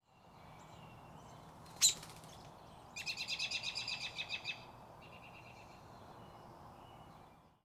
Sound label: Bird Chirps Then Takes Off Flapping Wings